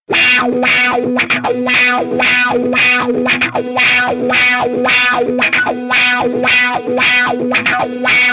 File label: Guitar wow wow